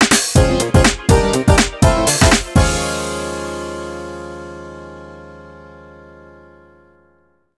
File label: Race Start; Gran Turismo 4